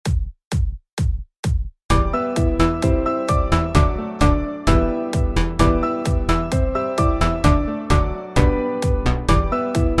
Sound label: dance